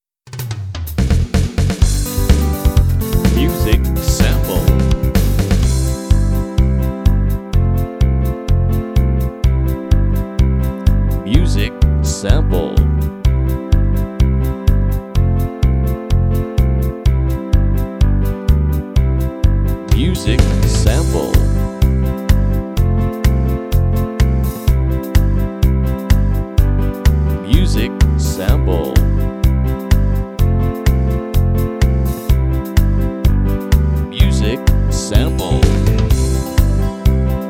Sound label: Hoedown